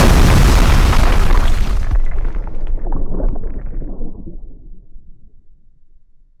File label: Huge explosion; Hovl Studio; SFX Battle sounds; WhiteMan Unity2D